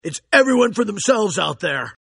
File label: win; mondo